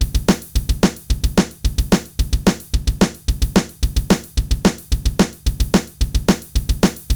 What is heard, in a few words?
Drums; deadfoot drum training